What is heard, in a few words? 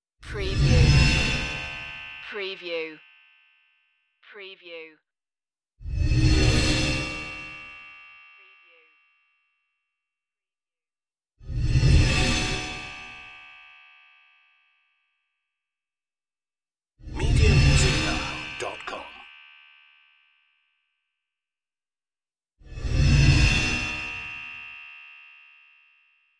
robot transform slides